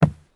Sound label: sprint wood; Escape from Tarkov